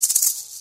Snake Sms

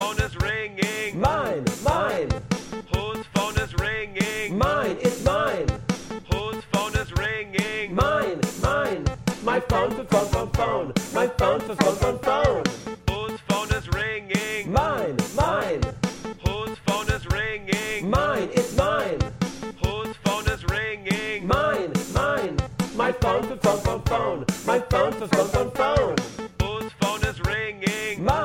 Whose phone is ringing

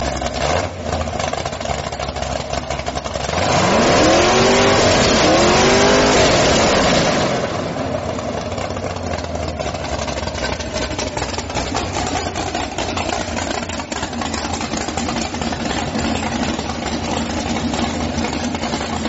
572 Chevy Big Block

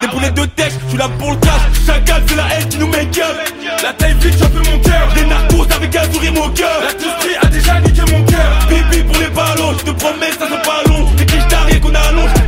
Bx Drill

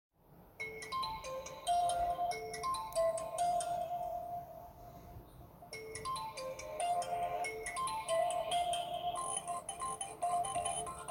oppo a37 ringtone

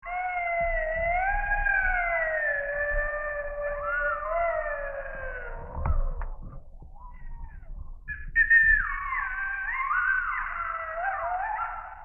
Coyote Howling

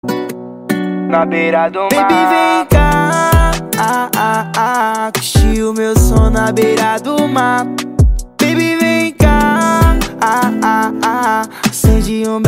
Luau Love Song

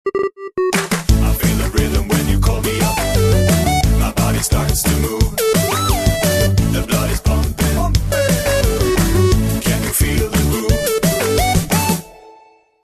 Groove call

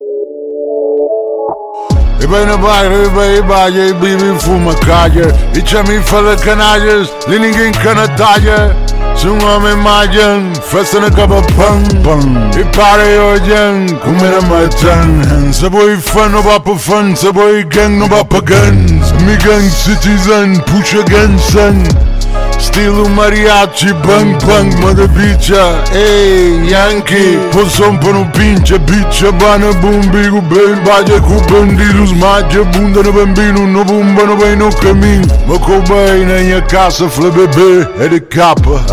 Mariachi Halloween

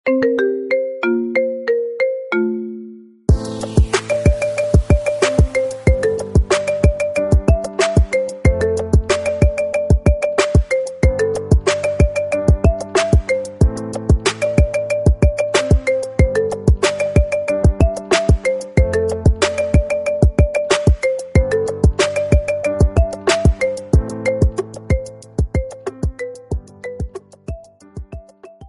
Marimba Remix ringtone

